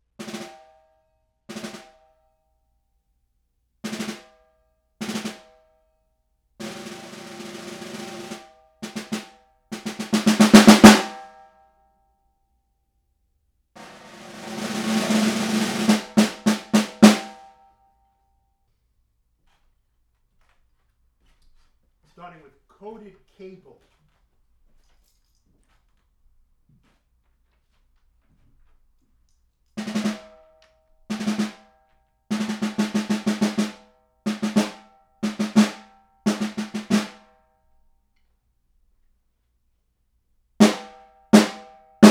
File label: super drum sound